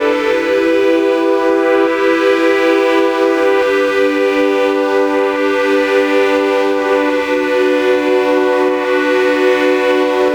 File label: NRG Pad